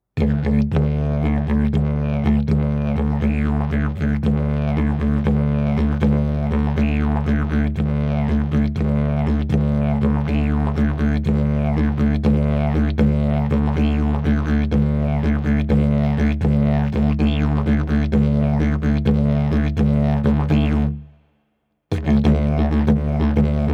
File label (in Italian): ritmo livello intermedio